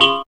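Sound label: DX7 CHORD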